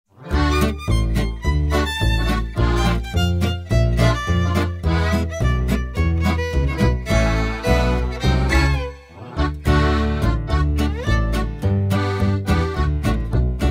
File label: Backing Track